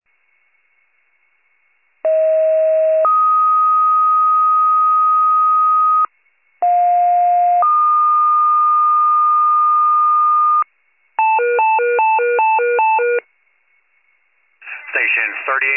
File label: FIRE pager alert